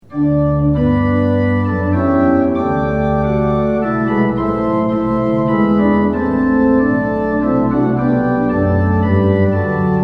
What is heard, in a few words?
tune; hymns; lillenas